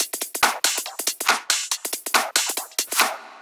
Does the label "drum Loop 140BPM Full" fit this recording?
Yes